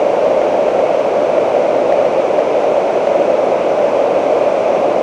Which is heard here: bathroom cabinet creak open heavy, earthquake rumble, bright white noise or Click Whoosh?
bright white noise